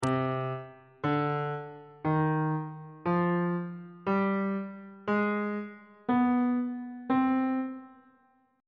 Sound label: b sharp harmonic minor scale